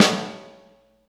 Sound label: SD, 180 BPM; Ueberschall; Drum & Bass Flash Trackz